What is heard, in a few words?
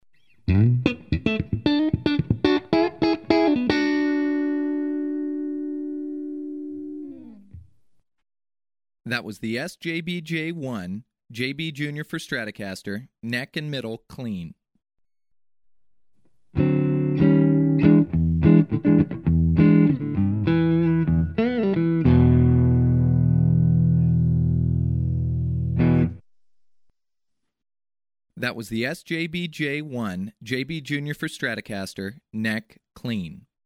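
neck middle clean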